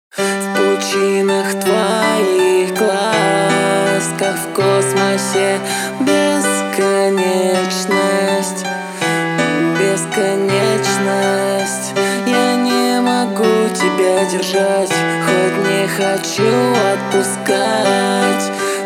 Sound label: acoustic